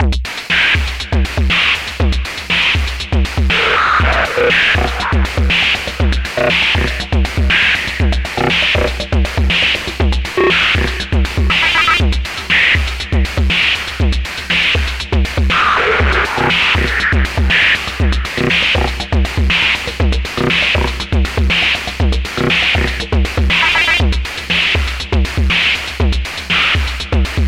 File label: Off Beat